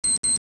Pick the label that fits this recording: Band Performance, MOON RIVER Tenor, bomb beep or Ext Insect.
bomb beep